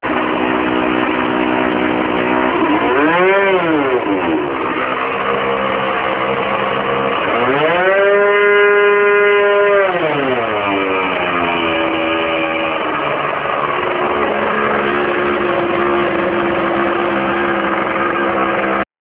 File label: motor